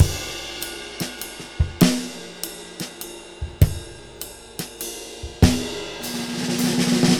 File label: 6/8 Loop, Drum Tools, Laid Back